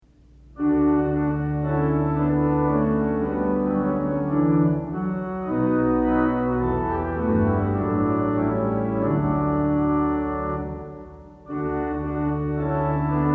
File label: Organ Music for Worship Services; O Render Thanks to God Above; Sixteen Liturgy Hymns